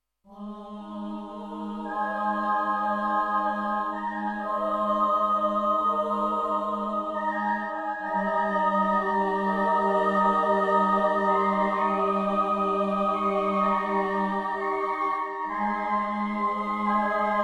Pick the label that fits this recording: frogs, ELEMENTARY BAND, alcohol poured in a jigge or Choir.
Choir